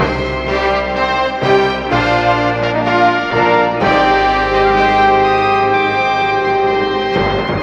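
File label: horns entrance of the king, loops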